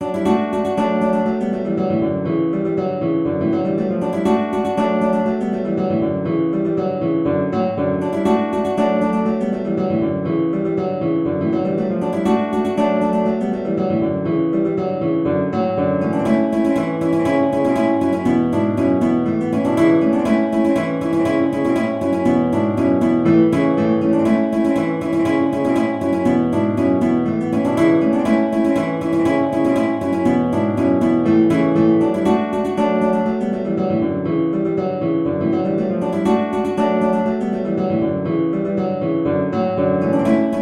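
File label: 2 guitars, guitar duet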